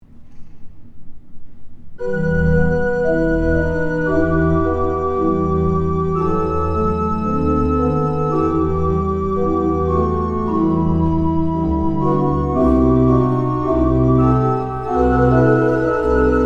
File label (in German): orgel; ich ruf; Neumann KM184; microphone test directional mics